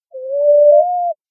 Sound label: Common Ground Dove